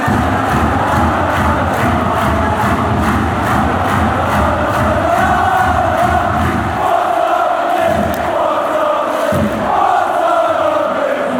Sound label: lololo, fanchants; rapid vienna